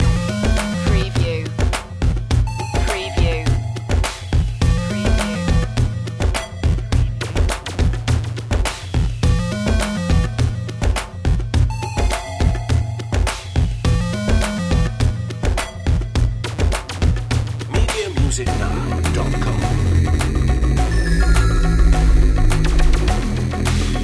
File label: retro video game snake invasion loop